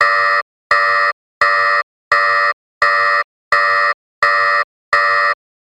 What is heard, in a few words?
science fiction console alarm; sound effects one